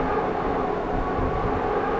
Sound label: weat wind